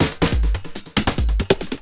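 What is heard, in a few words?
loop snare drum, 140 BPM, Drum & Bass; Ueberschall; Drum & Bass Resonance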